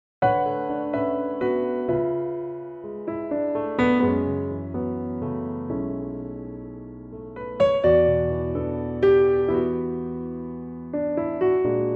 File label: D piano; key - D; Pianotrak